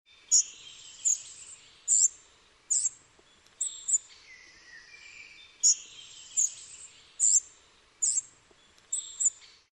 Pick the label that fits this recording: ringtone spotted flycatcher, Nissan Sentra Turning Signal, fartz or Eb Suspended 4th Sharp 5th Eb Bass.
ringtone spotted flycatcher